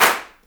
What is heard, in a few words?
CLAPS DRY, HANDCLAPS; Spectrasonics; Heart of Africa